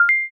quick press

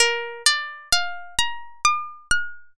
harp

